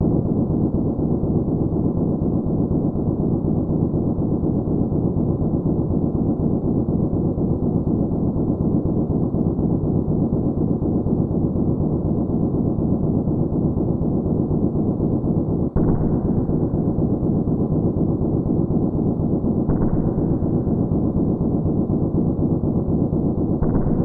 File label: Earthquake; Rejoice Aretha Oukoku no Kanata; SNES